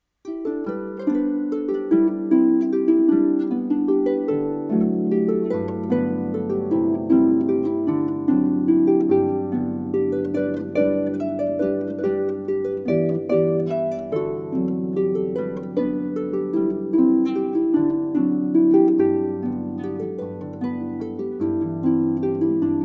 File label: Milking Song, harp